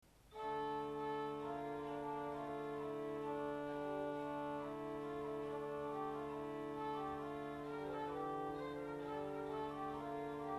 Violine 8, Orgelstimmen

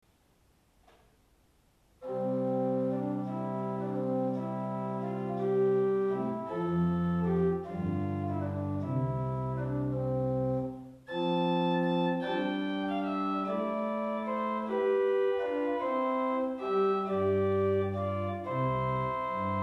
Princ 8, Orgelstimmen

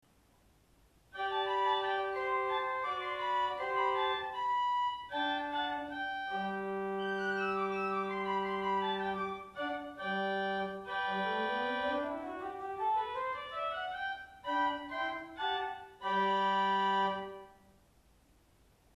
Oct 4, Orgelstimmen

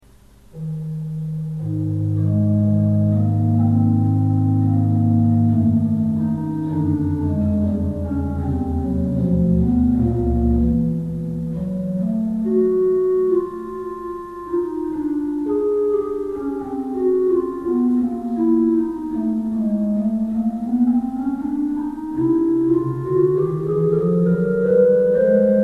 Liebl Gedakt 16

